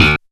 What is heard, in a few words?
pull bass; Korg; DDD-5